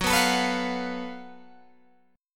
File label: Gb Minor Major 7th Double Flat 5th, Guitar, Open G, chord